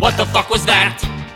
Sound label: what the fuck was that, group of men SUNG